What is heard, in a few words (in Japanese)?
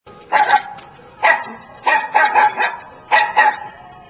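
koinu, puppy; 着信音やアラーム（目覚まし）音に使える、着モーションとして; FOMA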